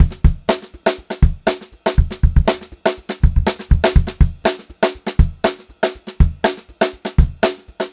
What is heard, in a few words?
121 funky, Funk-Groove Drumloops; USB Soundscan vol.38; AKAI; 90 s Sample CDs